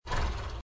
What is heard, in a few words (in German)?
Pferd schnaubt